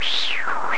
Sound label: wind; ST-XX Amiga Soundtracker Sample Packs